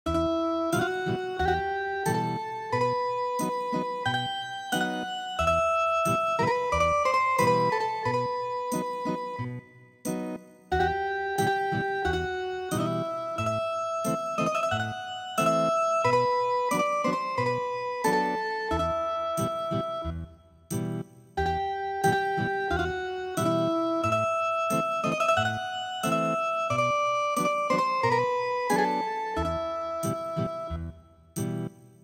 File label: Ethnic Guitar